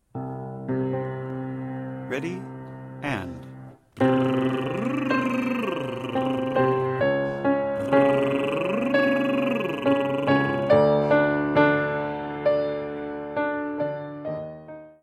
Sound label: Lip Trill Octave